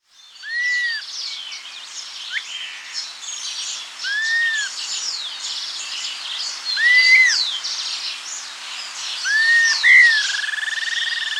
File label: Dusky-Capped Flycatcher (Myiarchus Tuberculifer Atriceps), Andean Birds; S. America